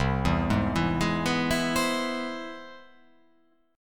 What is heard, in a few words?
C Augmented Major 7th